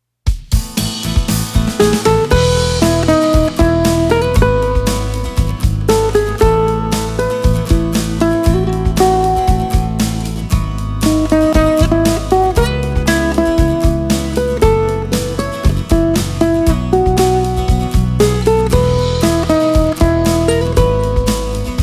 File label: Ukule Joy, Bright BG